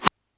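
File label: Rim reverse, Yamaha DD-6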